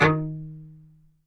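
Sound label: D#2 SPICCATO, CELLO; Northstar; New Gold VOL-2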